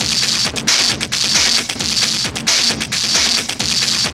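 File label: drum loop; X-Static Goldmine 3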